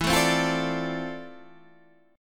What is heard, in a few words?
F Major 13th E, guitar chord